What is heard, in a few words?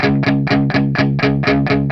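125, Vintage Blues Guitar rhythm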